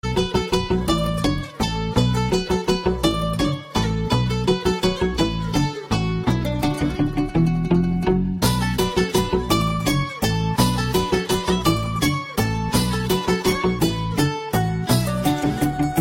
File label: Telugu music